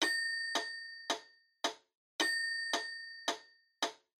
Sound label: Metronome Low Bell Click Mid